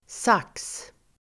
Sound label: sax